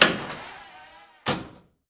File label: Floor Color Button Press